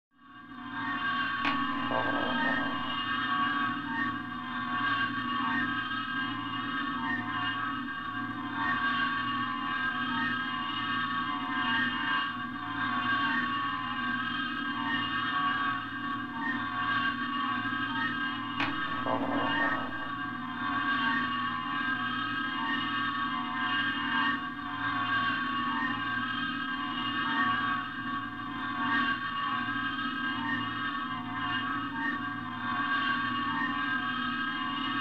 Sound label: transporter scanning